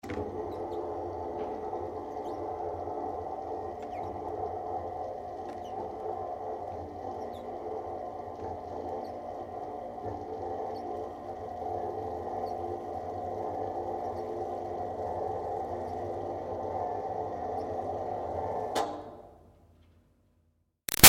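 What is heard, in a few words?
Projector Screen Medium Far Down Long; Offices; Big Room Sound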